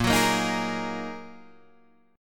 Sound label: A sharp 7th Suspended 4th Sharp 5th, Guitar, DADGAD